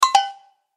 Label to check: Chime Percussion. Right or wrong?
Right